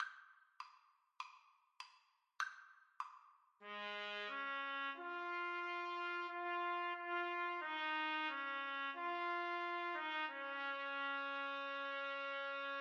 trumpet duet

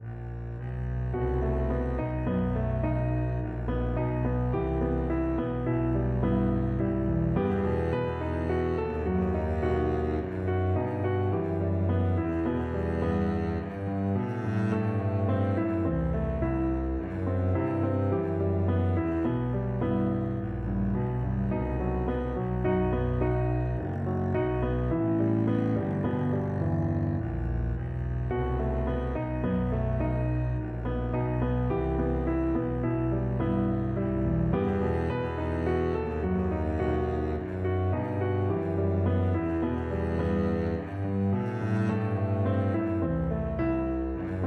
dark eyes, Double Bass

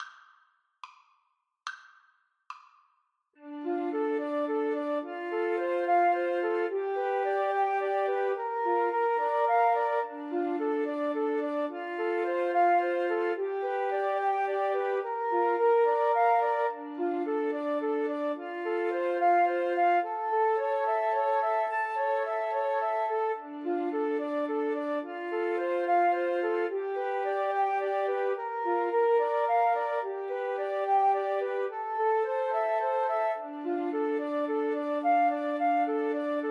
house of the rising sun flute trio

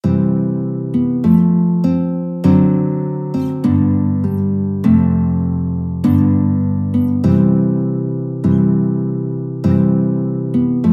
guitar duet

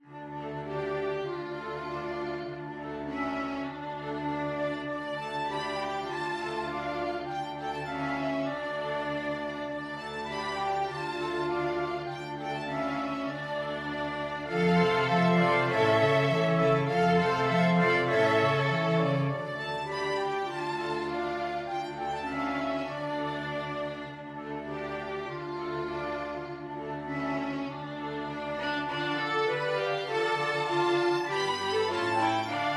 flexible mixed ensemble 4 players

